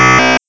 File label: Glitch Synth